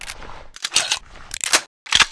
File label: classic reload, weapons